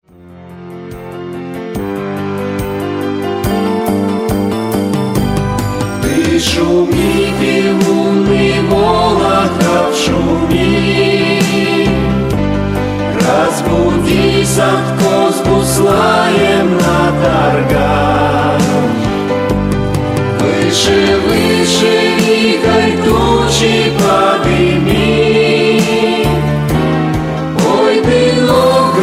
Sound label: choir, Novgorod